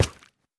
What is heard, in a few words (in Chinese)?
珊瑚踩踏声